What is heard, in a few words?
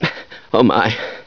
sci fear, scientist